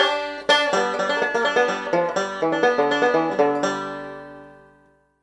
Banjo

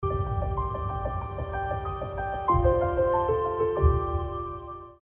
Piano, ringtone

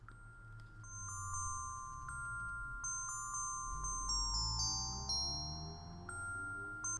EMS Sound

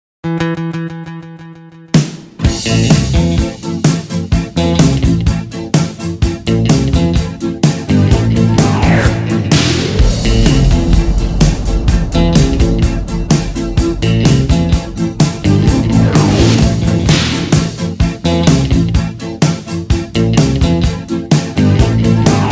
80s Hits

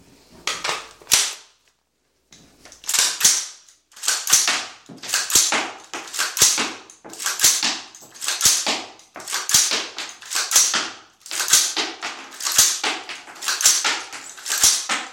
AR Smack N Cycle

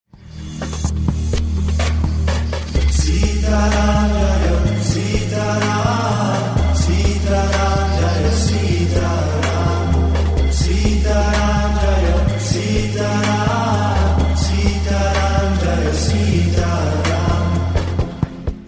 ram mantra

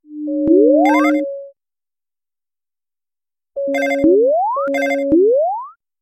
Simple SMS Tone

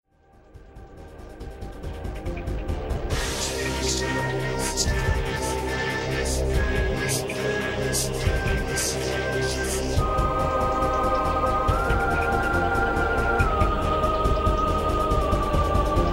menu; metroid prime 2

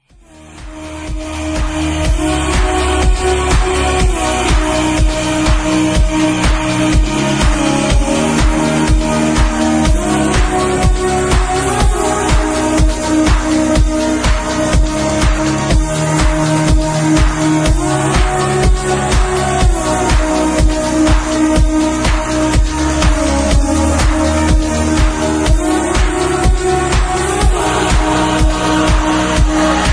techno trance electro sound system

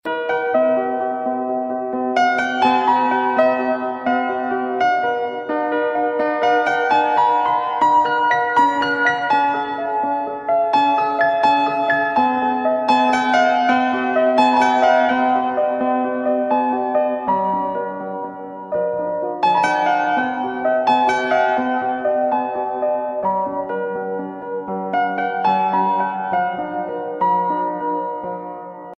Piano Theme; Korda

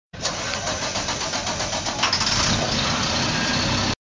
School Bus Startup

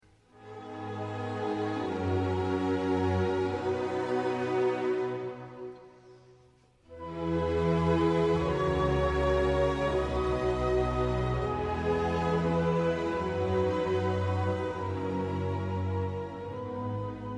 piano inst

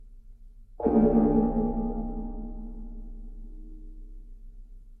Cloister bell

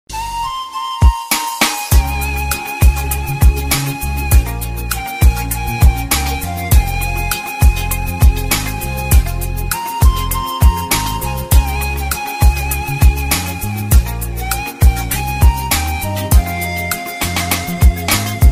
romanian panpipes

